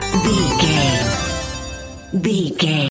collect item jingle fail

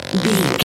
foley leather stretch couch chair

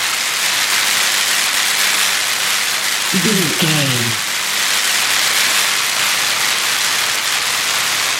electric sparks lightning loop

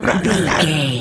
zombie voice general emote

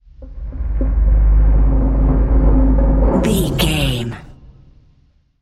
metal low creaking ship structure

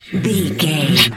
wolf growl

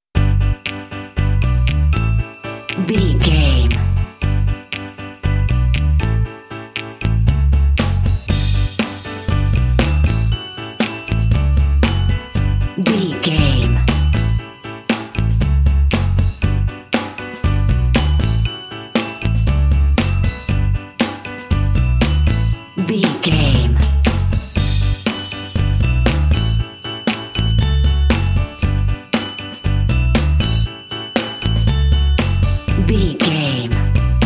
holiday giddy cheery

